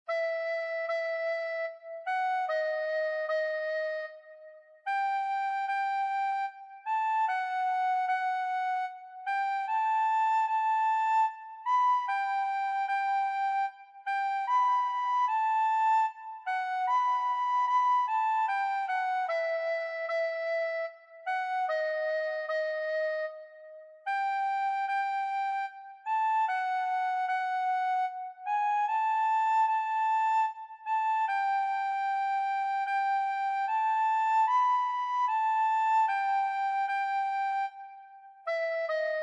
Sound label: sarabande recorder quartet alto recorder; handels